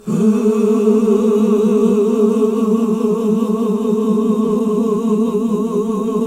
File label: Gosp. Oooh; Sound & Vision - Gigapack II CD 1 (Roland); HAL Gosp. Oooh 1